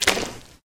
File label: paint, footsteps